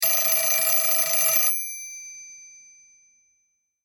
Ring Classic; Android; Google; Android Open Source Project

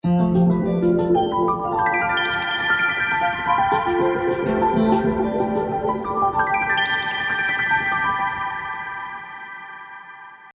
arpegy; ROKiT IO-3D; ringtones

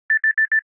alarms in call; Samsung Galaxy S10

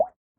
Drop, notifications; OnePlus 11

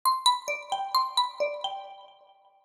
knock, ringtones; OnePlus 3T

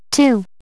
Call Two

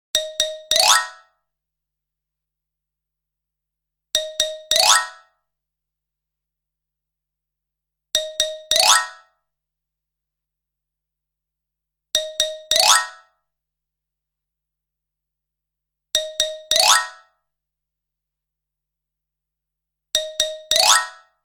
Glassware, Nokia; Nokia N8; N8 Belle Vietnam

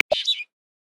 Small Ground-Finch, Galapagos; Xiaomi Redmi-8A

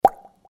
big Bubble; LG GD900 Crystal; Game Sound

